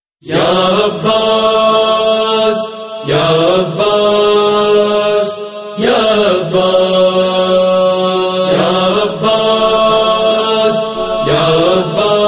Gujrati Noha

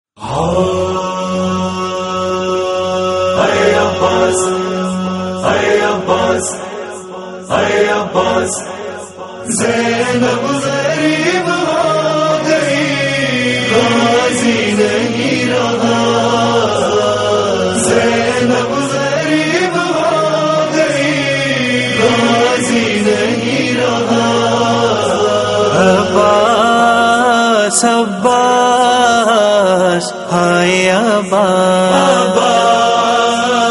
Nohay; Hazrat Mola Abbas; Muharram Nohay 2022

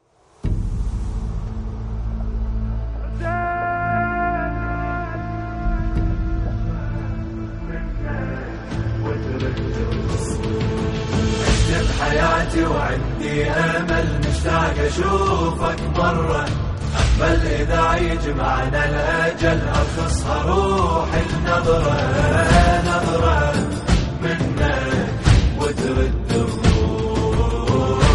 NAWHA ARABIC

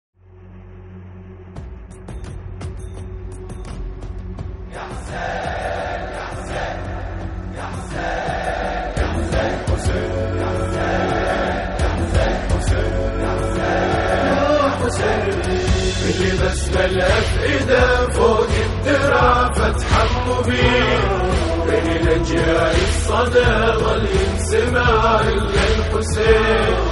NAWHA ARABIC

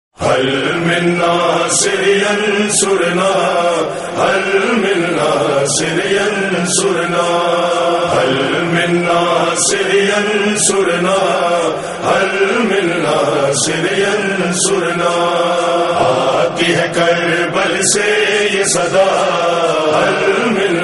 NOHA; Muharram 2022; NAWHA 1444

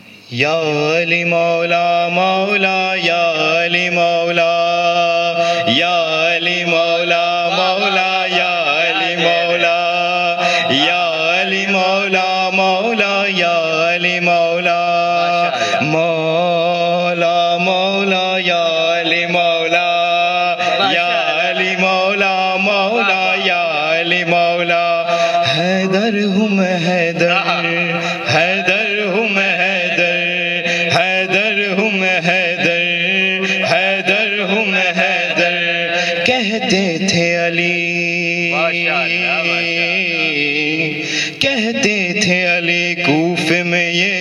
Manqabat

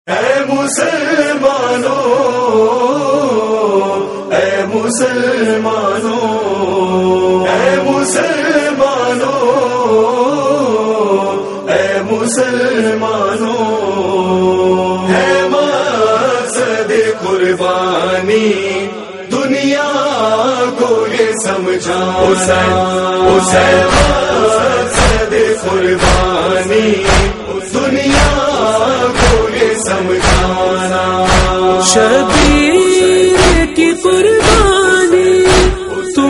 Noha; Maqsad E Qurbani; Muharram; Eid ul azha

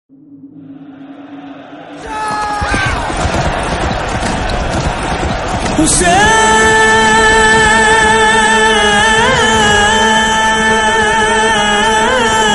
Nohay; Muharram 2022

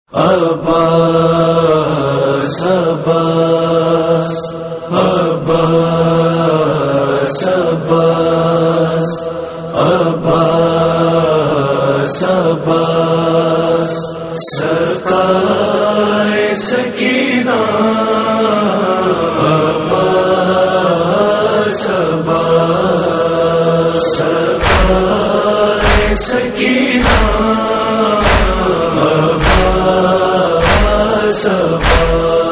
Nohay; Muharram; Munajat Mola Abbas; Isteghasa; NAWHA 1444

